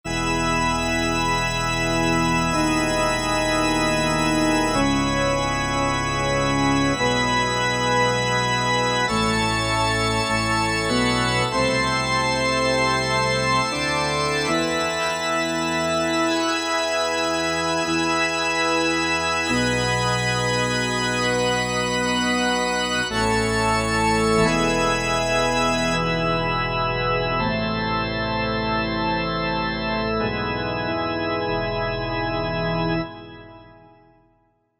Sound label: Pipe Organ, Korg MSC-9S Organ